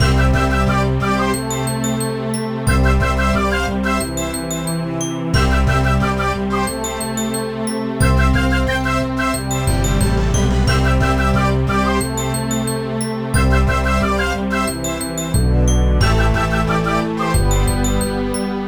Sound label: Jingle TALLY CASUAL; Super Scribblenauts 2010 NDS